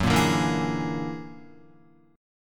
Gb Augmented 9th Gb down Guitar 4F,4A,5C,5G,5C,6E; Gb+9; strummed; JGuitar

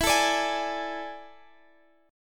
A Major 7th Suspended 4th E

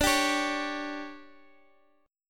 D Major 7th Suspended 4th, Guitar, Half Step Down

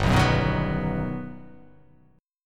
G Augmented Major 7th

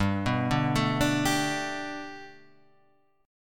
G Major Add 9th chord; Gadd9 Chord {3 2 0 2 3 3} arpeggiated

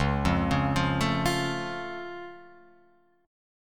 C sharp Augmented, C sharp, down, Guitar, Open F; arpeggiated; JGuitar

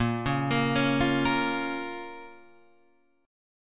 A sharp Minor A sharp down Guitar Open G

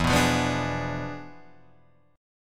E 7th Flat 9th E down Guitar Drop D

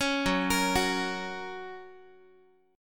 Gb Major, Guitar; Listen to Gb arpeggiated

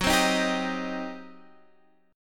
G Major 7th Suspended 4th Sharp 5th Gb

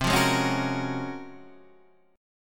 Db Major 13th C

